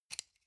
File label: sunglasses plastic grab soft